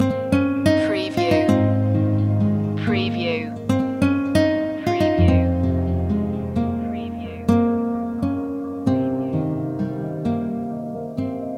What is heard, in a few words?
guitar blue loop